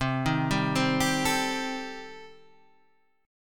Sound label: D sharp 6th Flat 5th, C